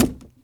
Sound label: box; DoomRTX; tr new cut boxes